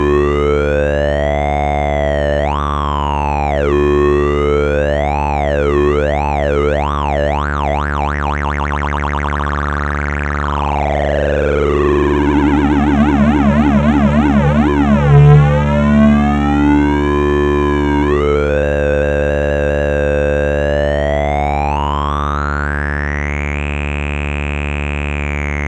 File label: flange